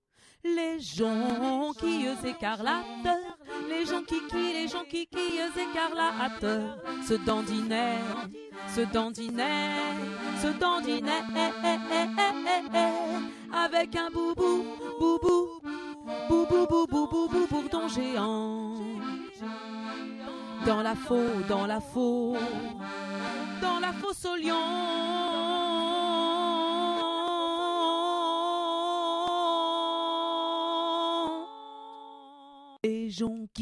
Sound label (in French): les jonquilles voix du milieu